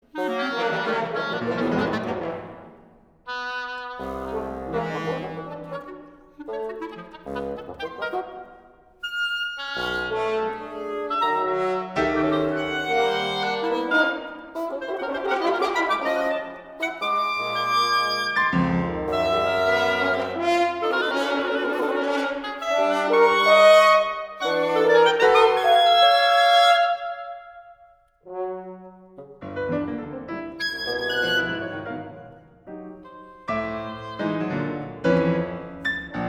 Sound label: quintet for piano winds